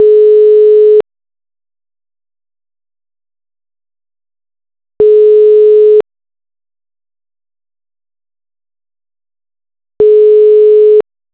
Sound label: faxaudio